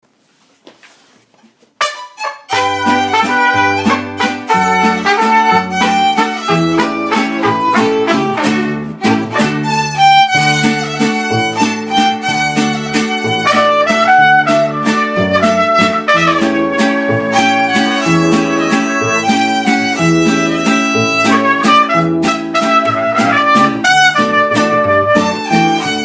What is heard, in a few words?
Mariachi; New York Mariachi 7; This New York Mariachi group was founded in November 2003. This group is comprised of 6 members.